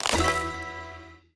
piano puzzle add sound; through abandoned 2 the forest 2016 gamerip pc

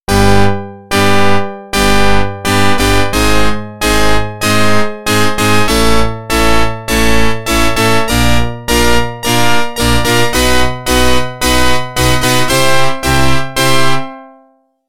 Organ; NHL 94

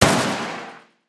gun

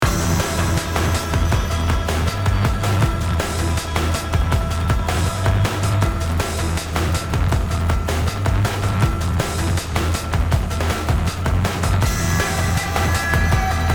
Exterior Music Combat; Sin Omega

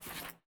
Unsheath; portal quest android; Antihero Skill2

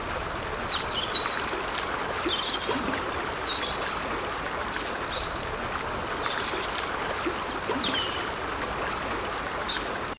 River + birds

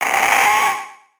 pain; Thorn

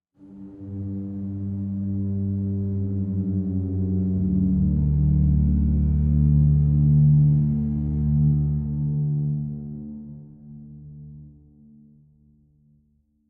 Organ Low; gamerip; that dragon cancer

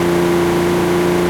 Toyota Supra 2020 High

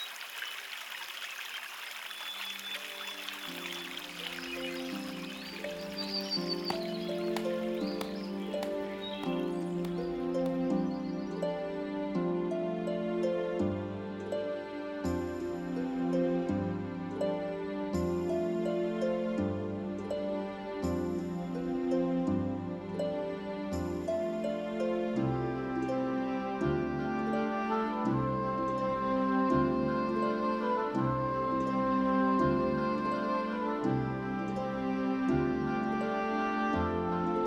Music Forest; gamerip; Ballad of Solar

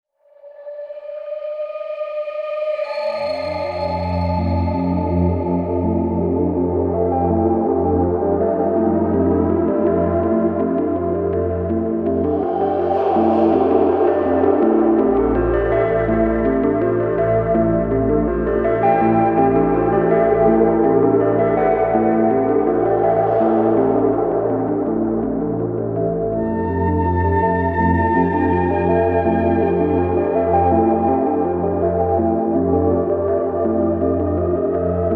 Underwater Swimming; assassins creed origins unofficial soundtrack ps4 windows xbox one gamerip 2017

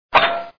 Pinball Flipper

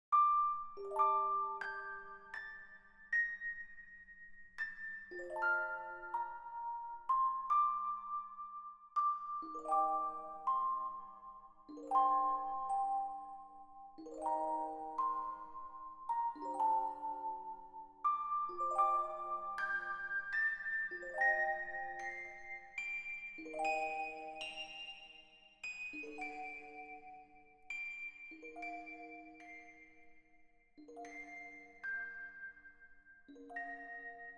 musicbox outro

